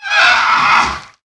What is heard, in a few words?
facehugger ouch